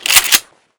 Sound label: sniper assassinate load; weapons